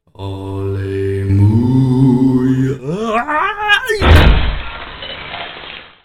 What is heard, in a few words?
Cow Tipping